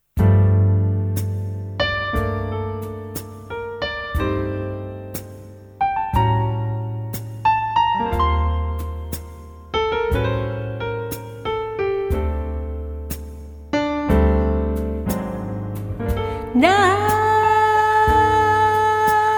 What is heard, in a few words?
Jazzy Torch